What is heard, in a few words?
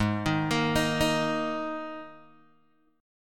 Ab Suspended 2nd Guitar Open G